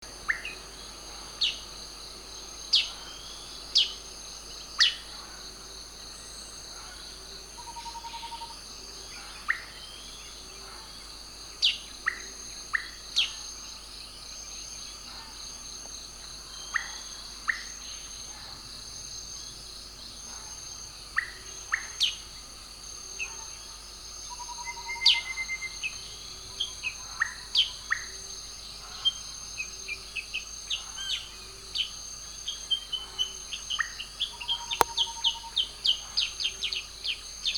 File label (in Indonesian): burung cep cep atau cucak kurincang